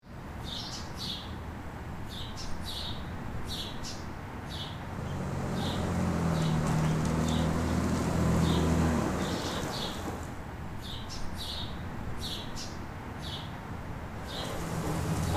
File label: Shopping center parking lot ambience